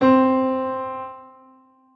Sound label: piano c; minecraft